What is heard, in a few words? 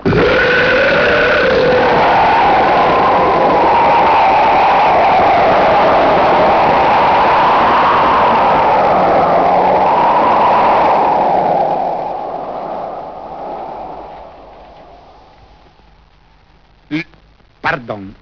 Wind